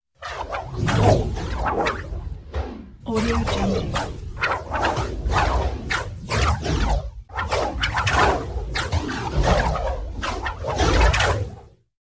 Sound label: plasma whoosh